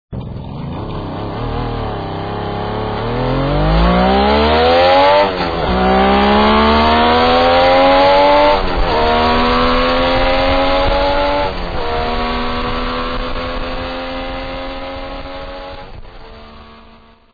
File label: gt750 acc